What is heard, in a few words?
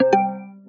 meeting notification